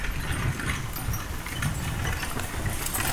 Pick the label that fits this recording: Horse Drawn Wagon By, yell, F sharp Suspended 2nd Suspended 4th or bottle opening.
Horse Drawn Wagon By